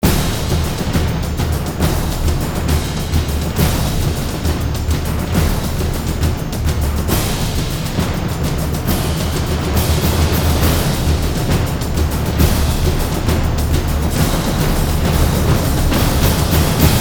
Drums, Orchestra and Choir; трейлерная музыка

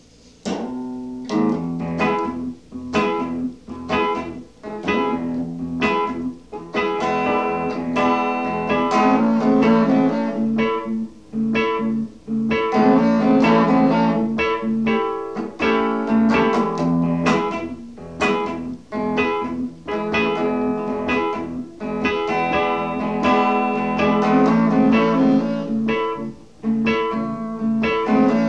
Reggae Jam

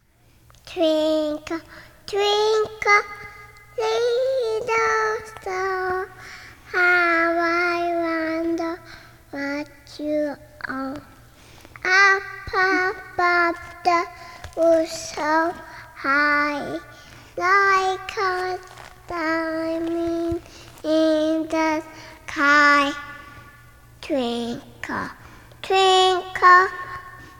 Twinkle Twinkle Singing 2 Years Old

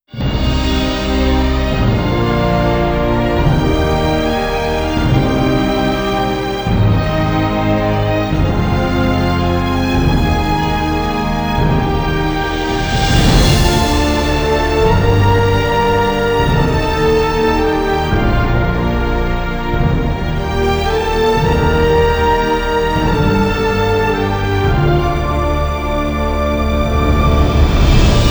(No Choir), Orchestra

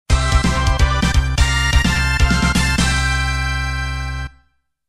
Fanfare

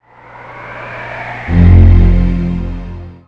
FANFARE; Americana

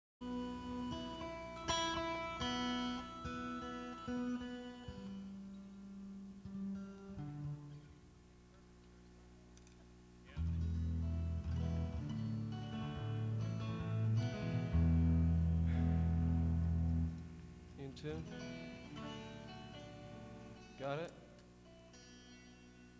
Tuning

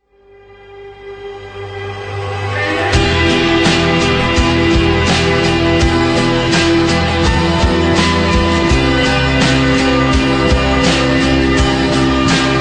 Acoustic

